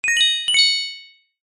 multimedia game sound gem treasure found

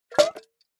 household teapot metal old set down full of tea; zapsplat

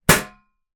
impact metal lid file box down hard

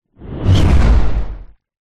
sound design whoosh impact hard wind; zapsplat

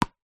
sport hand ball bounce throw hard ground

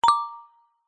notification bright metal tuned mallet chime hit; zapsplat

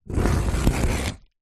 cardboard score cut slice with knife

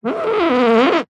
office chair leather executive rub squeak creak

